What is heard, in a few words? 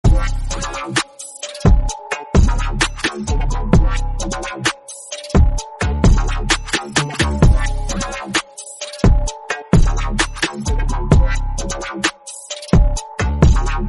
dark trap beat bgm